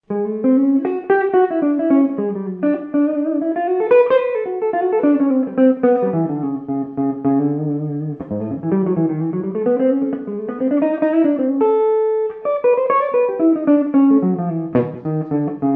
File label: neck, guitars